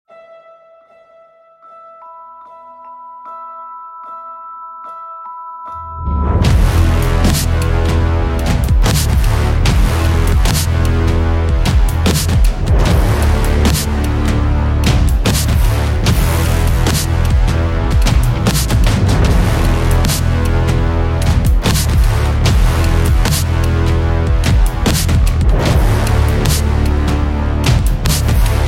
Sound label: Instrumental